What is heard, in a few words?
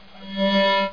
pulse; Bible Fun Pack; BIBLELND